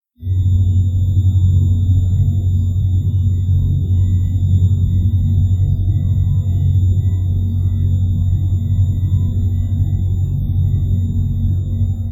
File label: anomaly, Ambience; Anomalies